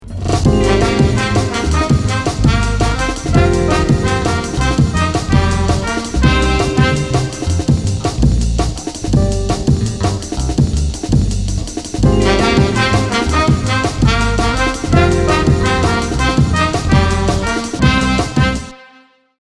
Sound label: electronica